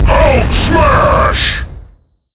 hulk Smash Start; incredible hulk chitauri takedown

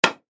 cue hit ball, pool; pool8ballmania

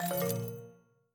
lose; Casualino; Baccarat

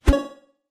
object pickup turbo; lightning speed

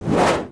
tiny woosh two; Egypt-Stone-War

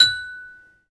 coin; inlogic-castledefense

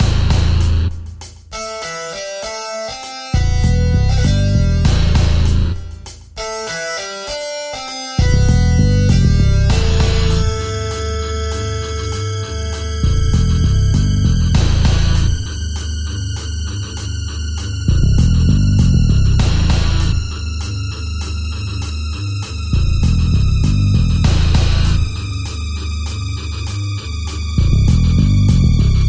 title screen bgm; incredible hulk chitauri takedown

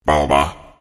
connect; monstergo; pokebot

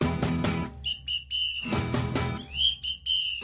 music brazil intro sting; lightning speed

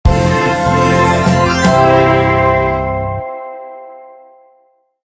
win; inlogic-castledefense

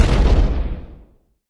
bomb explosion; acme rocket dash

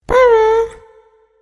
click; monstergo; pokebot

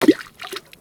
water splash; Dino-Jump

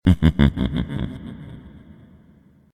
connect; monstergo; pokebot

